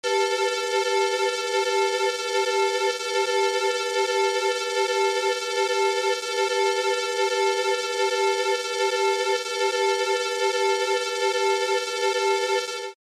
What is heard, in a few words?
Alarm Synth Flange